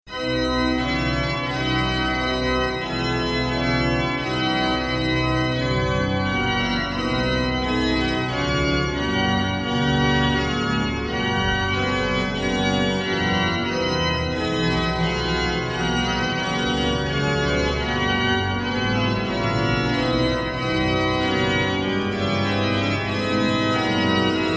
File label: voluntary, organ; historical organs; salamanca